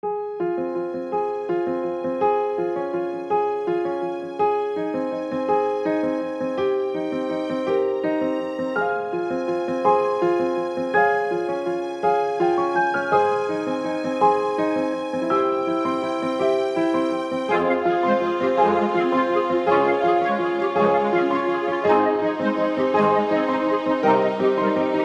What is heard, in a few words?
Modern Classic background music; generic